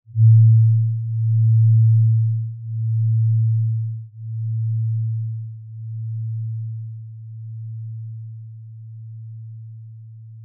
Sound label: singing bowl; peak band P01; misy dźwiękowe